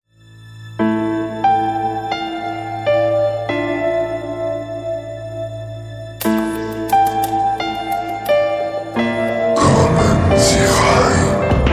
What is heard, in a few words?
Jingle mystic; AV MEdia Pool